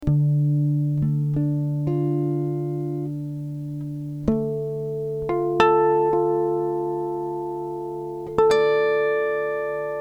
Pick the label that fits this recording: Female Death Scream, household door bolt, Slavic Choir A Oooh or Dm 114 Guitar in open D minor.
Dm 114 Guitar in open D minor